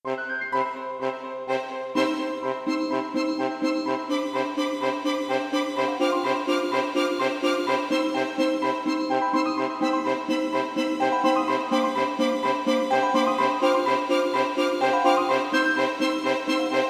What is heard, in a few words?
bgm